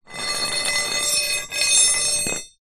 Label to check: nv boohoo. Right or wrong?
Wrong